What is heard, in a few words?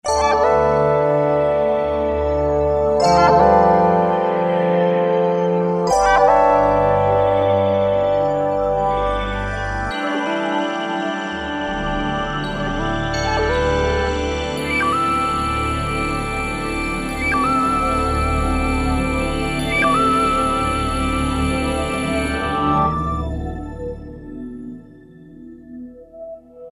Time Pad, E-mu Morpheus; Factory Presets